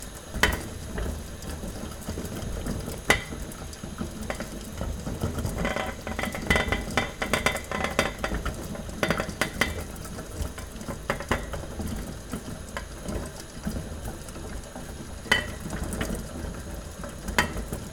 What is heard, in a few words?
Boiling Pot Slow